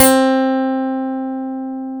EL A GT1 C3; AKAI S-Series CD-ROM Sound Library VOL-7; A GUITAR 2

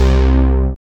BAS, Eurotech; Sounds Good

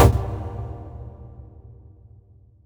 Stab C2, Club Techno; Zero-G; Stabs

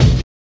STEAM ROLLER, KICKS; Zero-G; Funky Elements

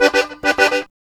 Accordion Rhythm 106 G; Zero-G Kocktail Kollection; Accordion Lix

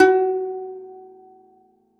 DOMRA, Segundo Domra, Plucked Strings

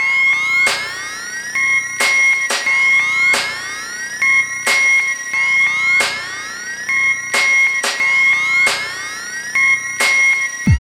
LOOP, Tekno Industrial; East West

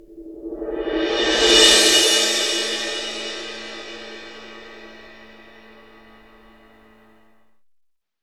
CYM CRES, cymbals; Roland LA; CYMBALS TAMS

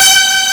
STACK, FAIRLGHT; East West; Global Sounds

